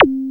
ZAP; Back In Time Records; Big Bang

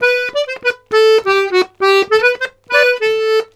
Accordions, BOUREE; USB Soundscan vol.40; AKAI

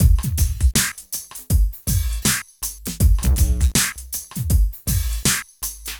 Smooth, R&B Drums

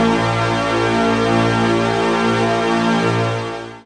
PAD Dance; Best Service Gigapack 1

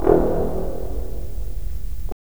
BRS, Roland LA Composer

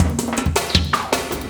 Loop, Drum & Bass, 160 BPM

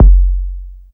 KICK deep, Techno Trance DRUMS

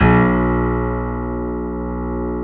EPM PIANO, KEYS, Synthesizers

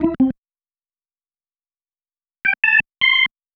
Single Riff, Organ; Sonic Foundry (Sony Creative Software); Joe Vitale Organ Donor

